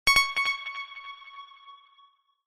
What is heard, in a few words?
Level completed